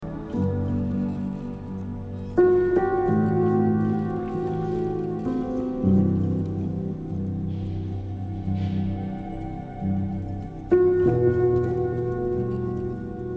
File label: piano diary